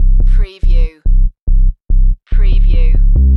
Techno-Trance Sub Bass Loop in C 142 BPM

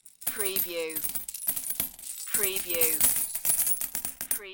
Many Coins Falling Onto Coins

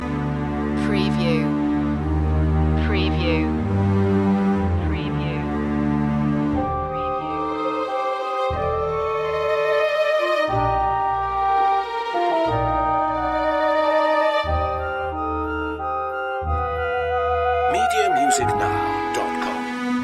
halloween loop

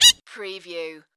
Duck Chirp

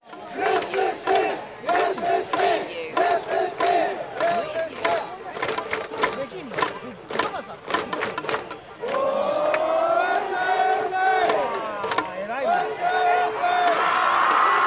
Tokyo baseball crowd chant Japan; Swallows; lynne-publishing

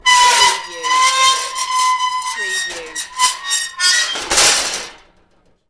Metal Screech Intense High Pitch

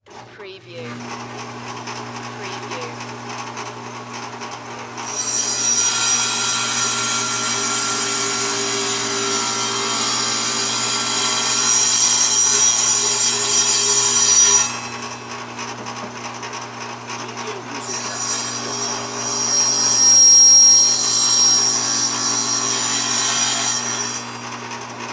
Table Saw Cut Wood

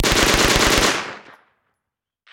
Submachine Gun MP40

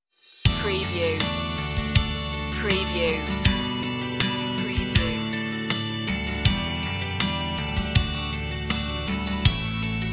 instr

